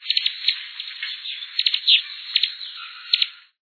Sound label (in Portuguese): estrelinha